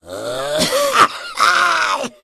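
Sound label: Smoker Alert, zombie sounds idle; cstrike; RA Zmsounds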